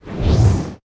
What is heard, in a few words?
attack